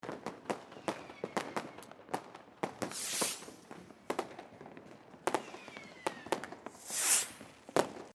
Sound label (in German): Silvester Zischer